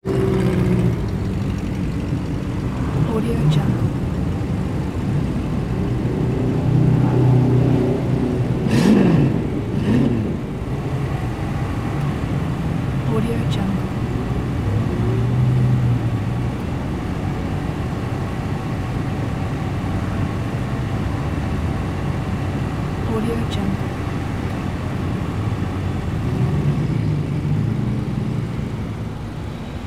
traffic idling engines vehicle pass bys

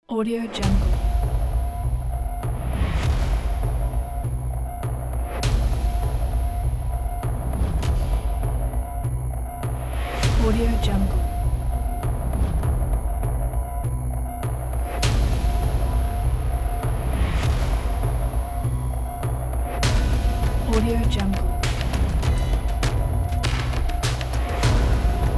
horror loading trailer

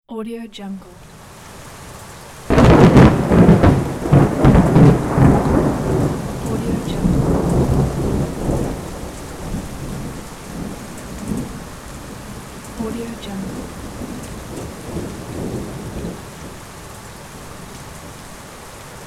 thunderstorm with rain and lightning

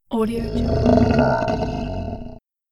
monster

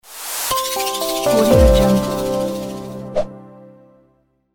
minimal logo

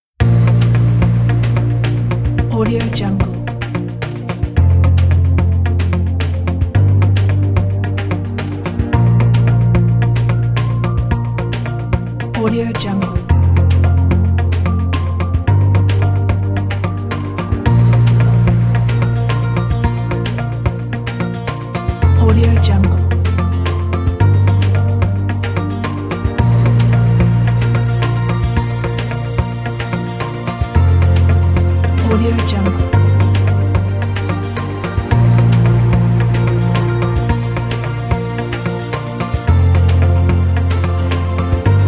calm

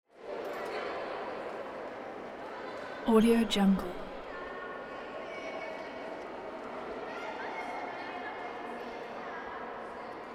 library rotunda busy live kids walla; prosoundfx

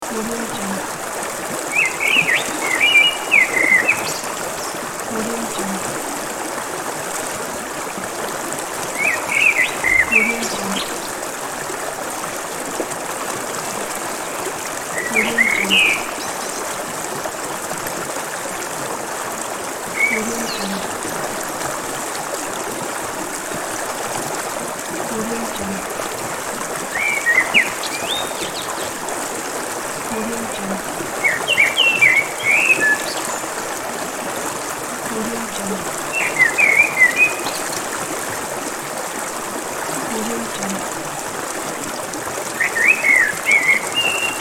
nature ambience

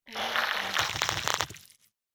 organic horror interface glitchy transition; stormwaveaudio

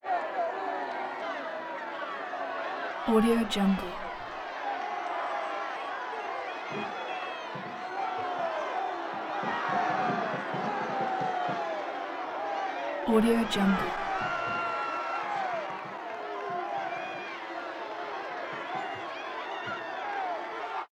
fight crowd yelling whistling booing shouts

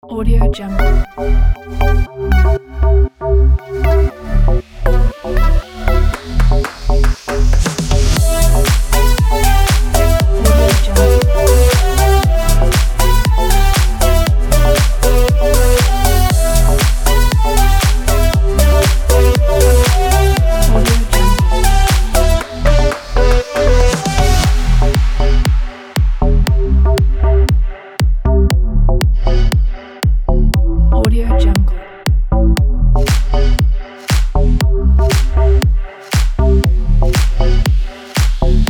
energetic fashion house

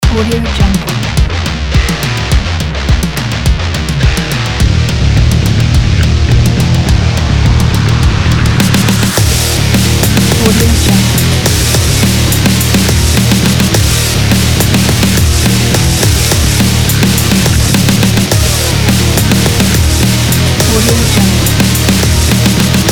powerful rock breakbeat kit

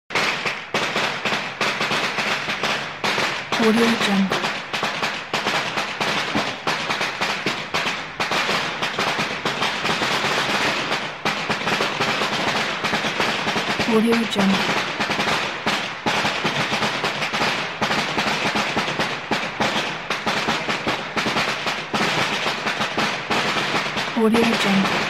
fireworks exploding

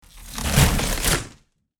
urban debris plastic little object passby

